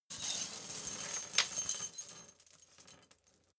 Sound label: metal chain midsize handling slow